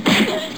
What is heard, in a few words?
hurt; raydium